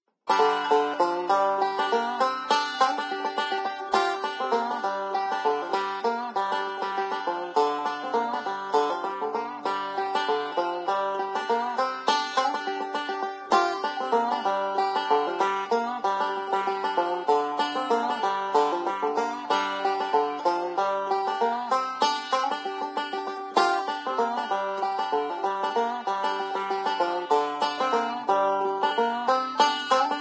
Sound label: Banjo; Zomboid; Lifestyle